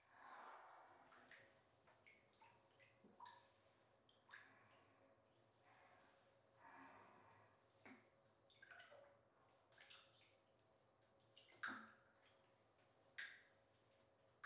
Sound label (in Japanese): お風呂場フェラ